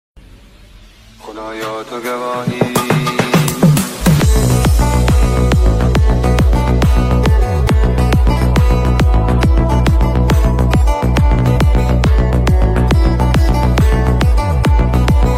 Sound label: English Music Ringtone